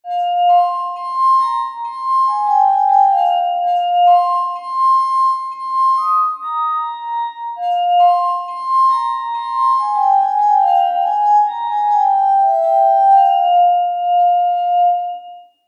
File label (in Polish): Harmonika szklana